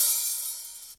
long open hi hat one shot e key, Royality free open hi hat one shot tuned to the E note